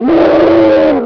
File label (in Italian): Yeti, Verso dello Yeti; Il JukeBox di Lara